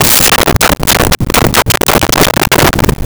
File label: Dull Wood Hit Teeter; Vintage Cartoon; Drums & Percussion